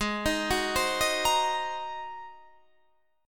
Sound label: Ab 9th Ab, Guitar